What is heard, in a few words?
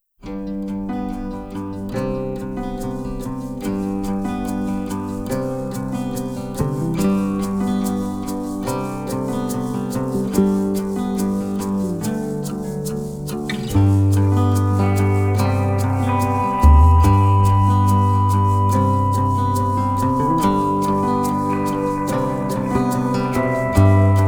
Music Cues